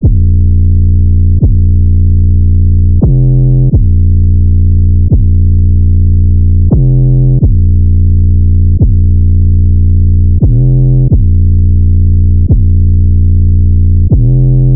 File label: 808 loop 130 Bb; Slate Digital BASSHOLE Sample Pack